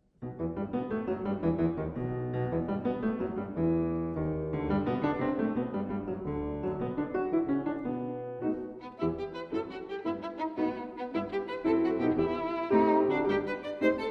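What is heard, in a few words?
violin sonata; peterson berger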